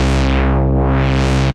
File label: Ulterzo, Analog Bass; Ableton; Retro Synths; Analog Bass Vol 1